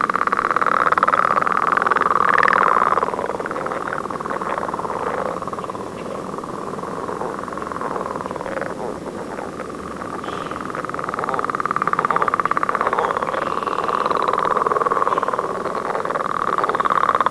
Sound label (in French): grenouille leopard